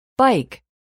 bike

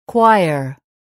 choir

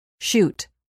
shoot

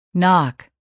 knock

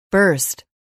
burst

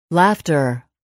laughter

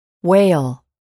wail

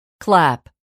clap

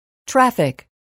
traffic

